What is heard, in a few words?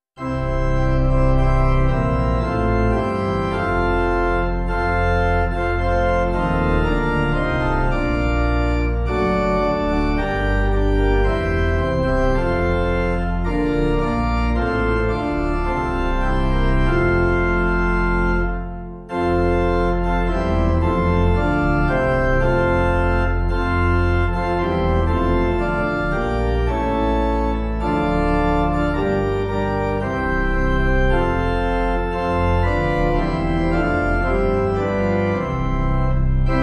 Pipe; Christ The Lord; St George